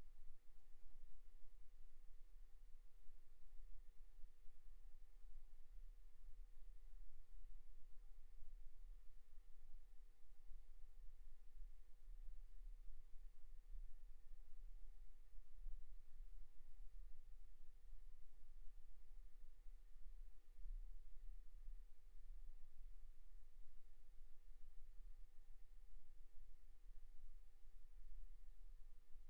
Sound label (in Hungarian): Alesis off Alapzaj 39dBA